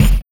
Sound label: Jungle Kick; Sonic Foundry (Sony Creative Software); Esoterik Beatz; Jungle Kit